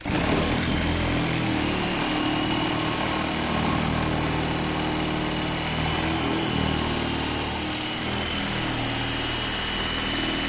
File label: Machine Drill Hand; drills